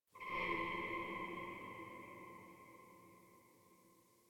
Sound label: Metal Groan, Ambience, Locker Room; Warframe